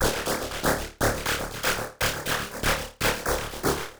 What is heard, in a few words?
Kaossilator processed (120BPM); musicradar